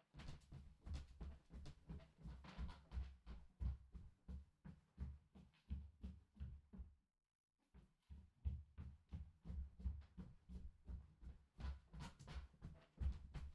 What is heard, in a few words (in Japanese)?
行ったり来たり 高速, 足音 靴下; KU100